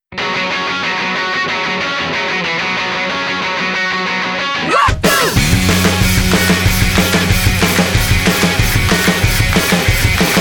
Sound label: Urban Disco